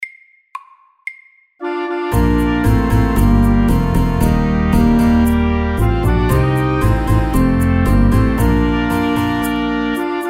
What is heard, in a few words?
Mariachi band MEDIUM